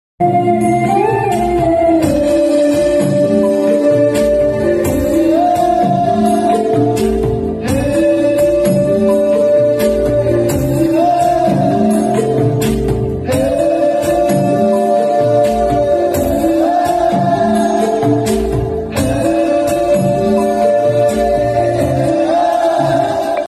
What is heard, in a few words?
entry bgm; nuvvostanante nenoddantana; srihari